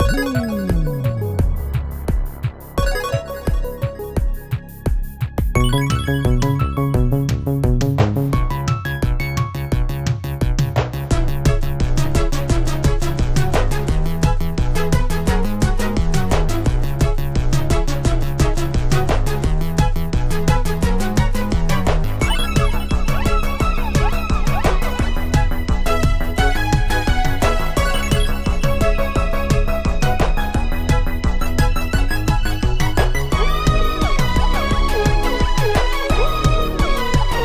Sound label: boss battle; Hoggy2; PS Vita